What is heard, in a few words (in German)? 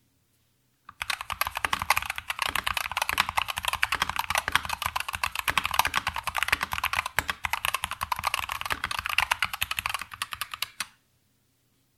Function 2 MiniTKL Normales Tippen